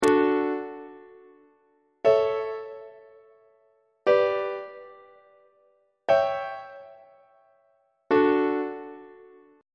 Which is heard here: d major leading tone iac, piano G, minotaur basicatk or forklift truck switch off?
d major leading tone iac